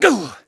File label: GRUNT, VOICE; Pulse Racer